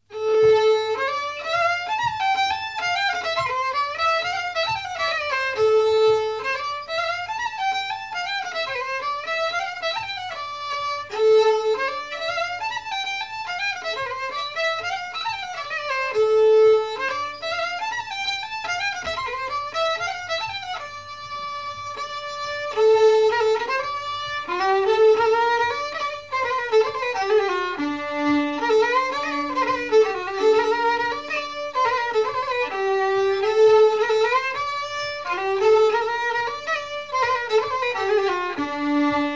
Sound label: Polska; Efter Fadern